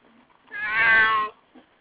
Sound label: cat meow